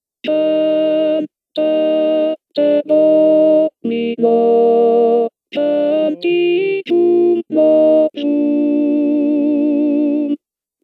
contralto cantate domino; haendel

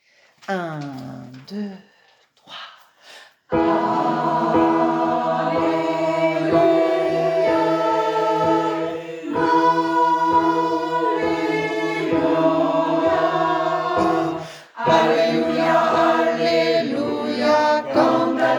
verse 1 tutti

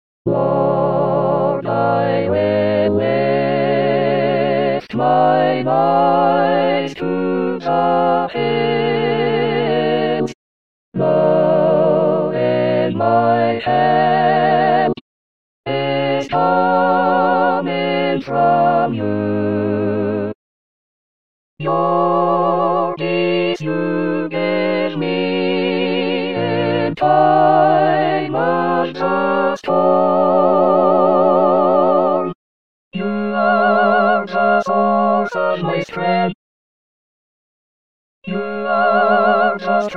tutti, gosp

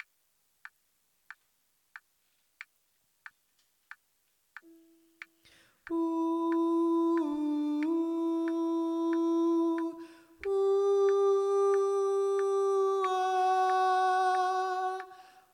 a mezzos

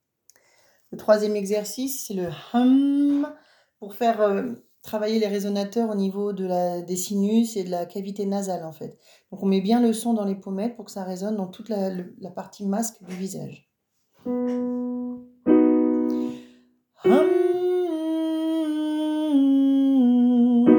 hum, echauffement vocal

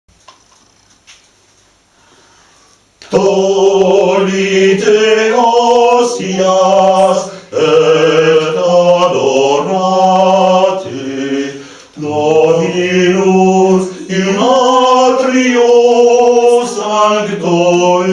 bassi; tollite hostias

